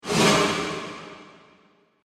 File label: Flashback Transition Whoosh